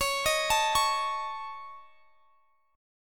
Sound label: C sharp Suspended 2nd Sharp 5th, C sharp; C#sus2#5; arpeggiated; x,x,23,20,22,21